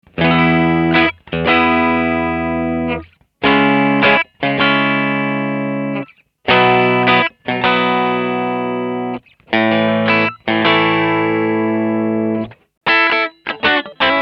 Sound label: Sapphire